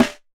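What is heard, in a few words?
Snare Drum Acoustic Basic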